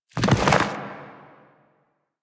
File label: Ground Spike Dissolve; Warframe; Zariman; Void Angel; Abilities